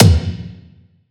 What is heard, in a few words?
Tom F; samples